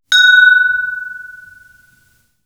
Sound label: tiny bell